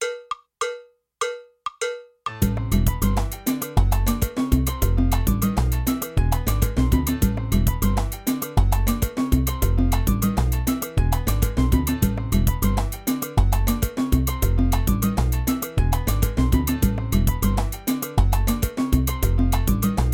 timba bass

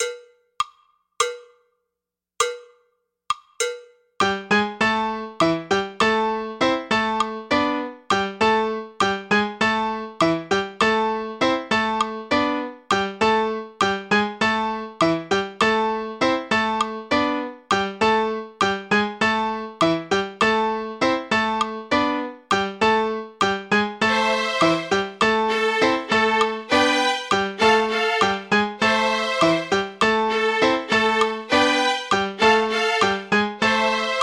tumbao slow

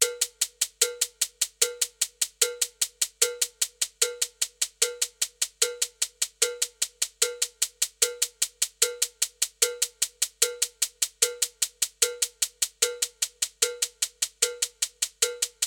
main beats fast

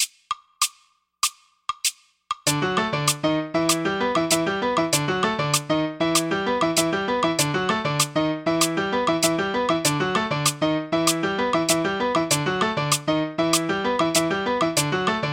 tumbao fast

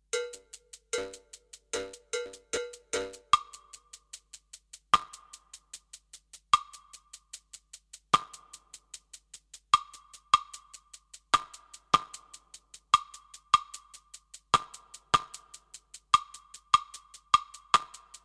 tresillo trainer fast

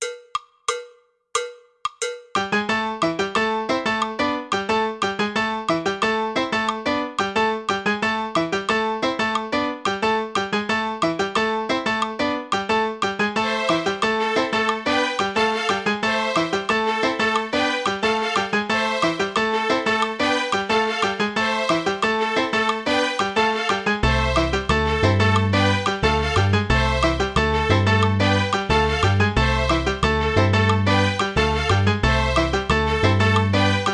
tumbao fast

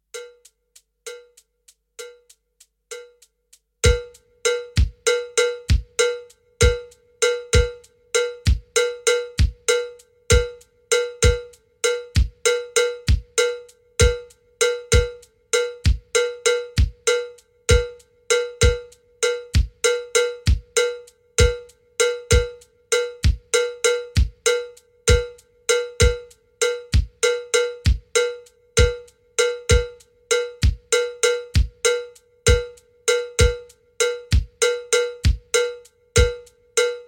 standard 12 bell slow

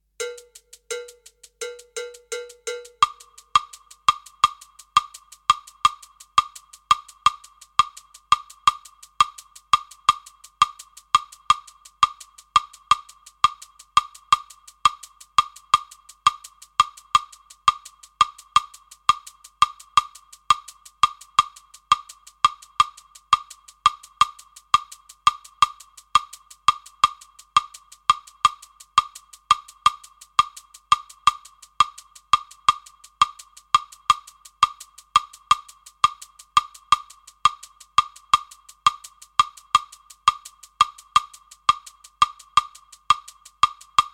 tresillo fast

